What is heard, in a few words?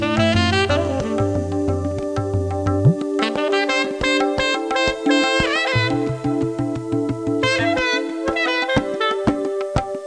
rhyth, loops; endorfun